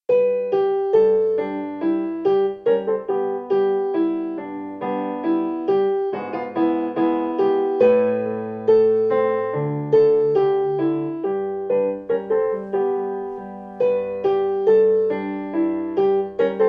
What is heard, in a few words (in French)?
spiritual playback vif